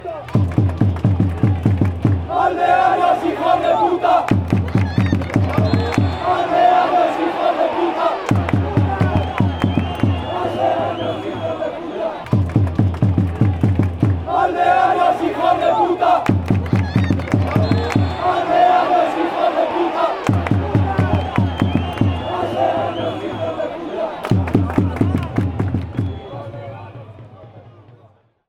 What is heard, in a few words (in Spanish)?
leganes canto